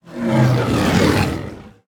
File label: Druid Of The Claw Death